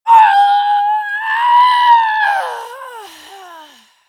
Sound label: female combat die, Screams; schreie; menschen